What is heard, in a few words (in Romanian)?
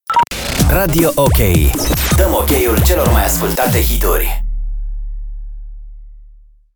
Jingle Generalist; Radio OK Statie de Distractie